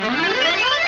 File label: quick accordion gliss up